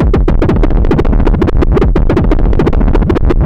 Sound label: DR 909 140, Hard & Loud Techno; USB Soundscan vol.01; AKAI